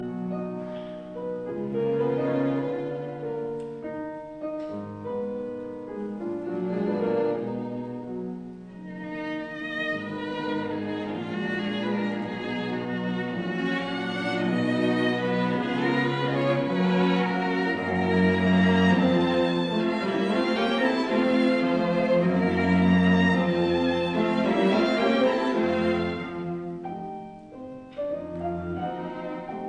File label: Piano Quintet in E flat major Allegro brillante; Budapest Quartet